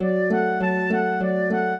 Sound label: trio, flute harp; Mozart